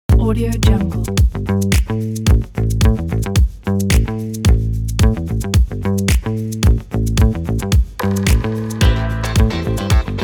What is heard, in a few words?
classic funk background; noizzybeeproduction